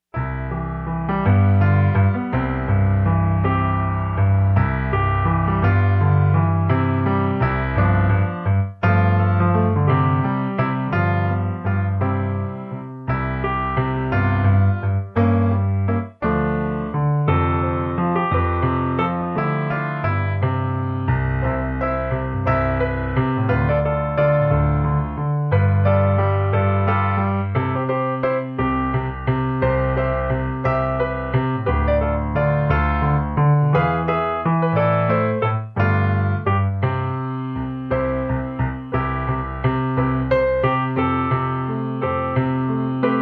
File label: Fast Waltz Piano